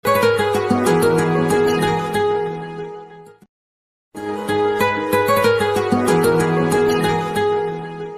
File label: peaceful ringtone